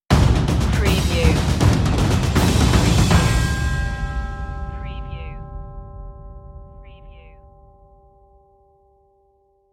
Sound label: land stinger